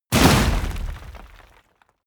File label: explosion dirt metal; explosionen; comedy-cartoon; soundpool